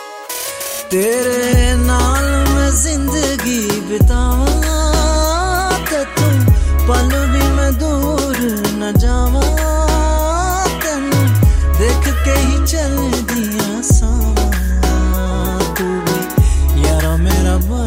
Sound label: Sad Punjabi Song Ringtone